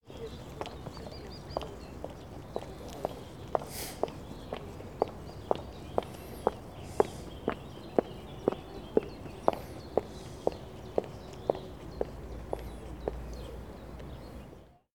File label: Footsteps On Heels